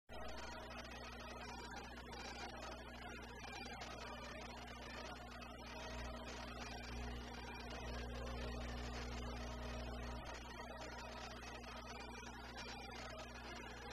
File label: bagpipes